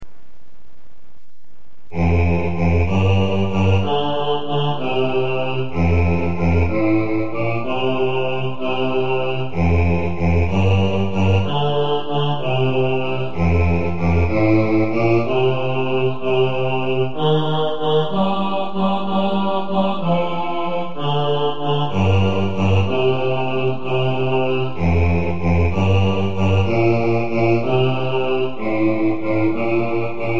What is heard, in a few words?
4-part bass, choir